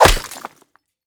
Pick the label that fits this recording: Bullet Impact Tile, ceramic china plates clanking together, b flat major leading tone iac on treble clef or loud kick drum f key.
Bullet Impact Tile